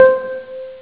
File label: bell